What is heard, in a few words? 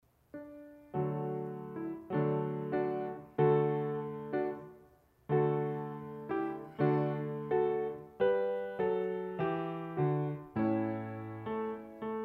Instrumental; calvaryministries